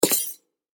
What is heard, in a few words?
Footsteps Cowboy Boot Spurs Single Left Soft; SFX Bible 6